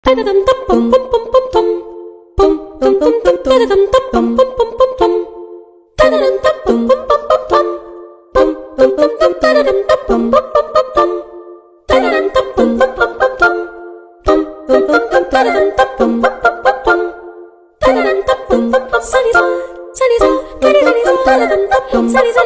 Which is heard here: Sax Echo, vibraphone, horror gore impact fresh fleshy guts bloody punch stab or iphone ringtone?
iphone ringtone